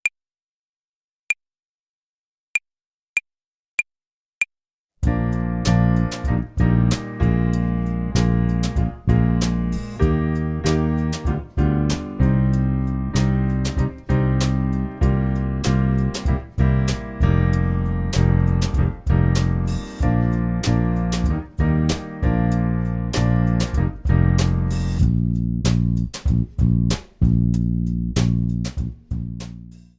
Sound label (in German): Tempo und Rhythmus Übung, Play-along, Jazz Club Klavier